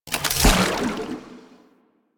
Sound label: Harvester Item Pickup Inventory; Ambience; Warframe; Venus Open World